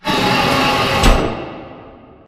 Assembly Floor Close; Boomtown; Titanfall 2

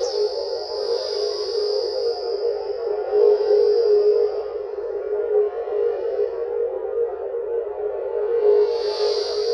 green laser room; corporate; Titanfall 2

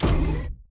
footstep sprint close; Titanfall 2

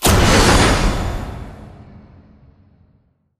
vertical fan switch shutoff; beacon; Titanfall 2

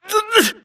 grunt death quick; Titanfall 2

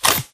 control room panel activate; Titanfall 2

